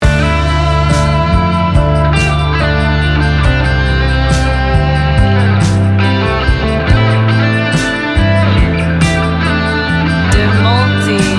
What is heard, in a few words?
instrumental ballad; Sore hari selalu punya cara sendiri untuk membuat hati luluh.